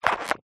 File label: cartoon rubber latex pants squeak; zapsplat